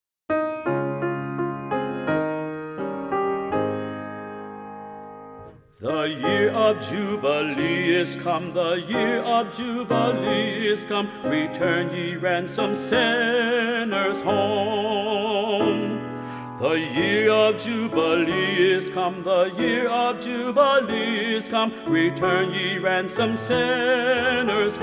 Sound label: trumpet